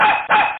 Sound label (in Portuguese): Cachorro